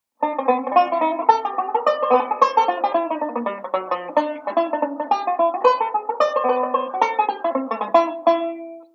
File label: I V I Etude jazzy, banjo